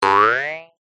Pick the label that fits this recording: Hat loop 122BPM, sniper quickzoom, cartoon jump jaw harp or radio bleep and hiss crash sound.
cartoon jump jaw harp